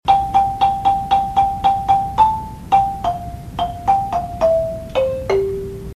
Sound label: lead main var, marimba